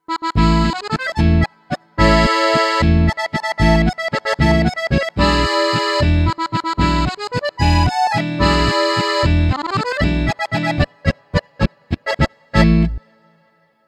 Texan normal Kontra hart

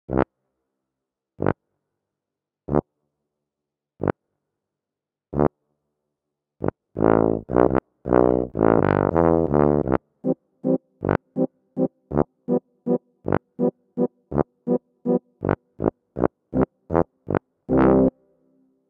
B Tuba und F Tuba Diskant auf Akkorde

